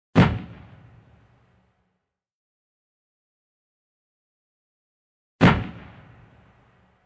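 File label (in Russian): выстрел из танкового орудия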